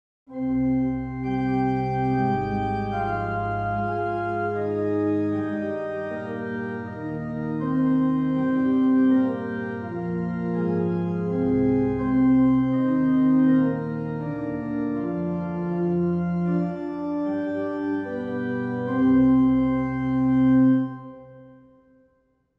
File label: Organ